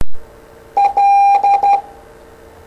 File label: Morse L